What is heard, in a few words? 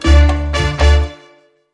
Hit